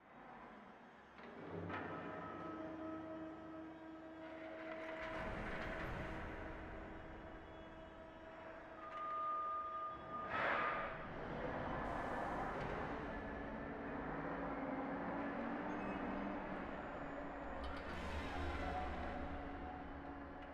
Ambient Home Loop; Drifting Lands

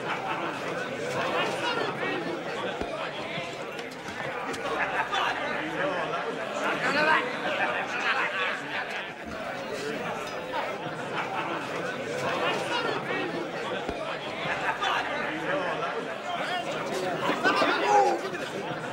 noisy tavern; gamerip

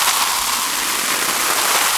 Aspire Fizz Medium

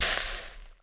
Liquid Burnt

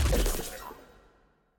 Cat hit Glitches

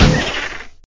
Tap

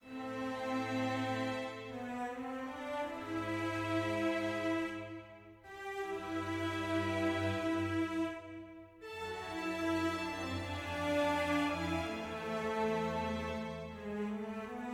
sad med

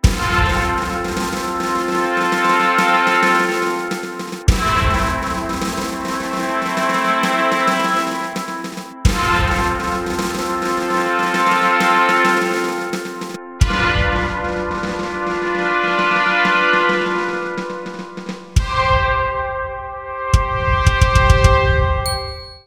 Mission Victory

